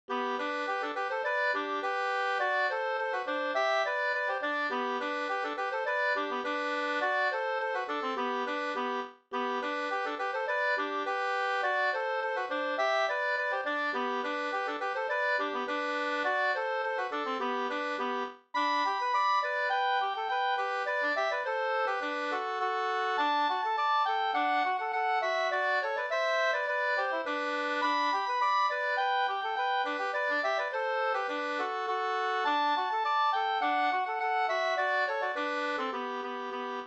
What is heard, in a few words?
polka